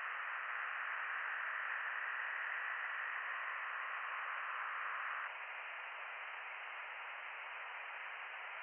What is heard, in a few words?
from radio, 7177KHz